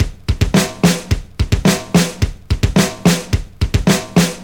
108 bpm drum groove f key, kick tuned to the F note. Loudest frequency: 1664Hz